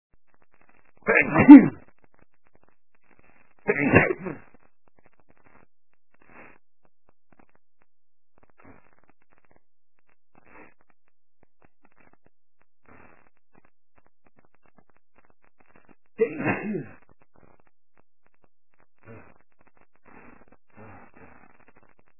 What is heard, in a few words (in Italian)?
Sternuto, male